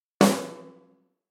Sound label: snare